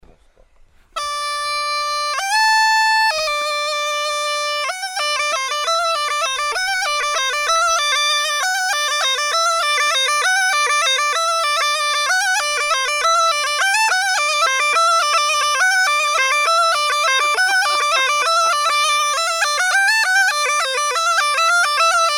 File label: horo, Gajda; Lesson Recordings; Petar Usmivkata Aleksiev